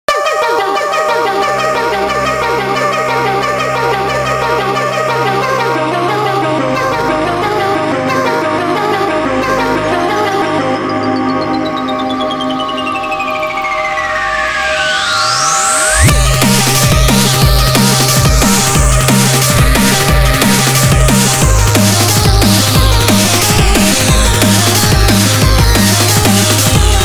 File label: (Instrumental)